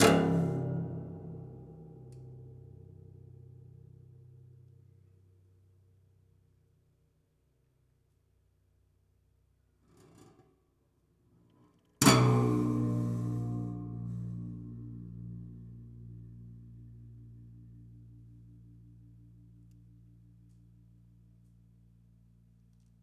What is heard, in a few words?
Plier Pluck, Piano; Piano Destruction